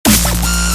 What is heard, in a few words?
Dubstep